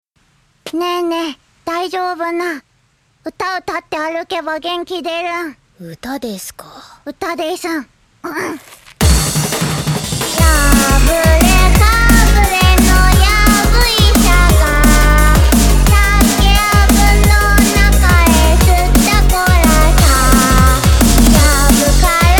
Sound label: Drum 'n' Bass